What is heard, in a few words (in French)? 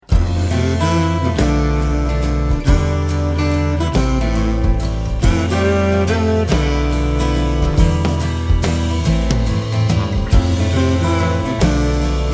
guitar voix